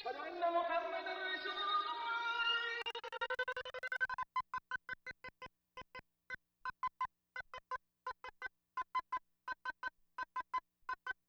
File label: Vox, Hip-Hop